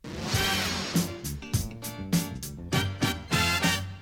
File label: topformat maxi jingle single